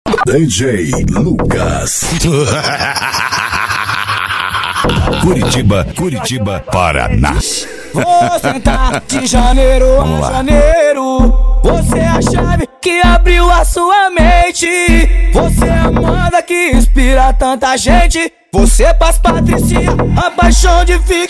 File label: mega funk, eletro funk